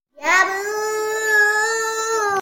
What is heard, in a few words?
yaboo, yahoo sound effect